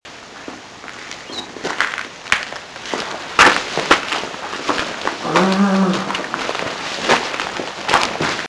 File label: Moan